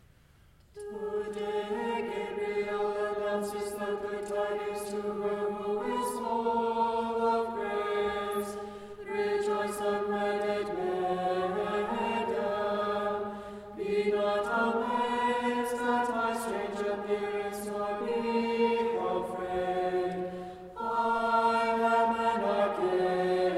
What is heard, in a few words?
Kievan Sticheron Example Unison; Tone2